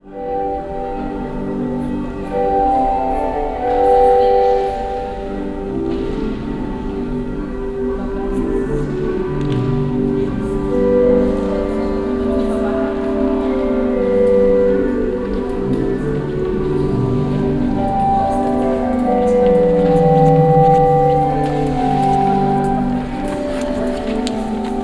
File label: IN CHURCH, Cathedral Organ; ILIO; Propeller Islands